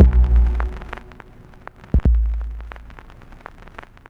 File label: 117 bpm, breaks; Zero-G Beneath the Planet of the Breaks